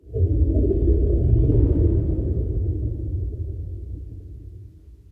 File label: Ambient